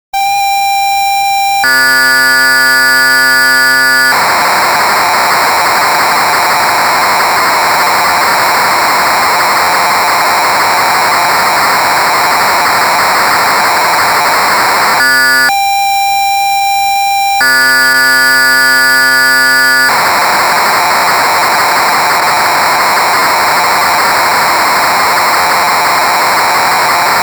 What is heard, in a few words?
Korg DW-8000 Preload A Tape Dump